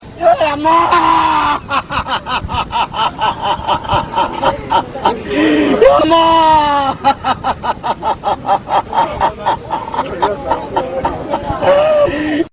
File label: wail